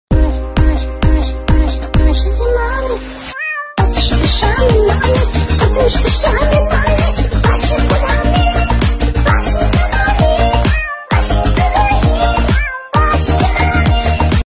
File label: Ringtone